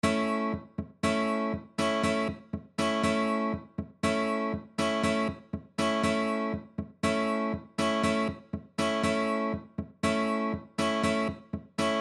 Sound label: Rhythm, guitar